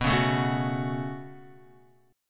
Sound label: B 7th Suspended 2nd, Guitar; x,2,1,2,1,2; strummed; JGuitar